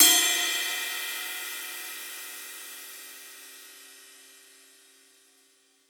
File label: cymbal sizzle ride; Drum Sample Pack; One Shots; Slate; SEISMIC